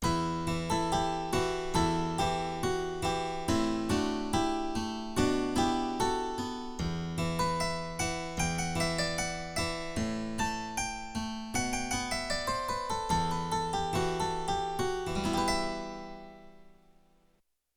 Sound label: Long HC, Harpsichord; Yamaha TX16W